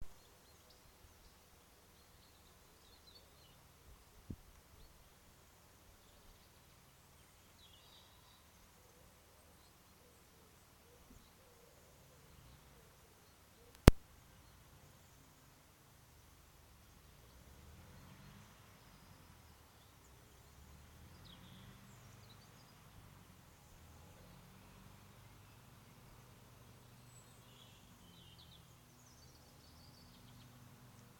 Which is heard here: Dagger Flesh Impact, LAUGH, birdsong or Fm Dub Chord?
birdsong